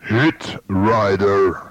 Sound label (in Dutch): Jingle; Hitrider; Gebruikt voor het radioprogramma Hitrider; Allerhande